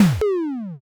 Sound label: knockback